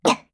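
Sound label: attack, Voice Line, Korean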